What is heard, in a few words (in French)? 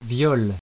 viol